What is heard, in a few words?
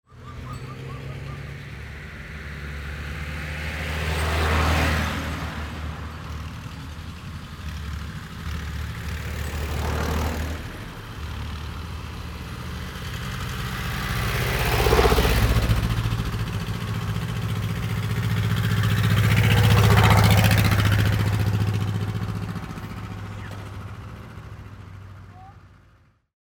cars passing